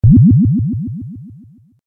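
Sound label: liquid bubbling short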